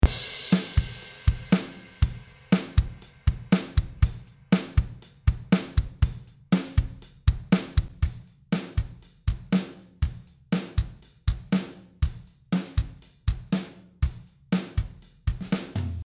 Drums Chorus Snare breit; GuitarRig

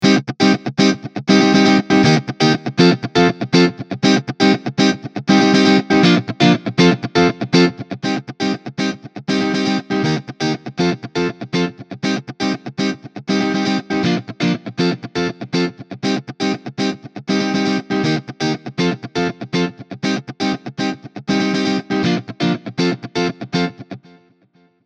Tremolo Klangbeispiel

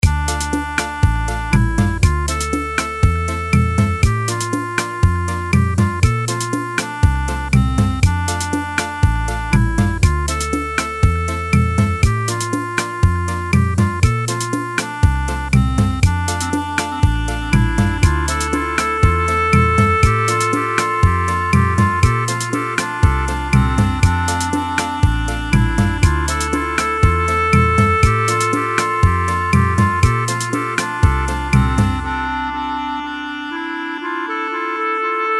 Klarinette mit Viertel Pan Delay Beguine

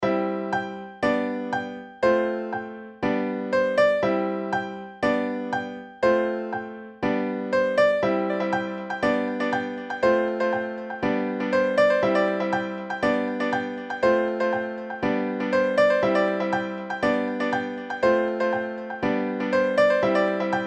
Klavier mit Delay

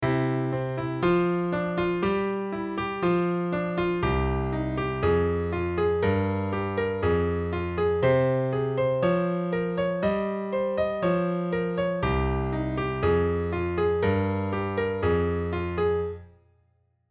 Klavier unbearbeitet

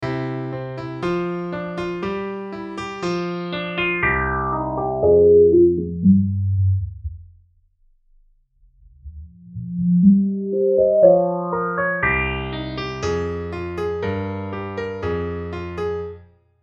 Lowpass mit Resonance

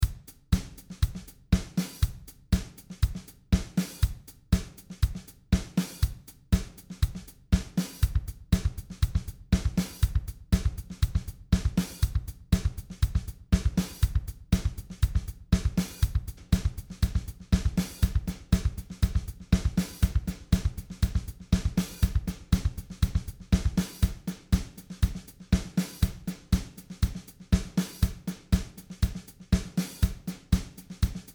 Drums mit Delay

